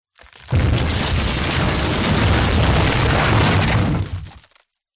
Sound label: impacts building collapse